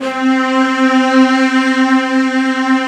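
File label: bright strings C4; BRITESTRG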